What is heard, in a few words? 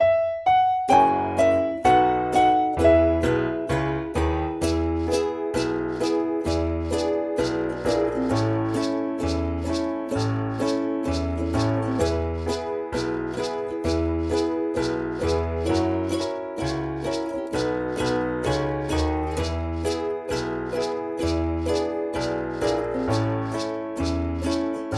Ko au karaoke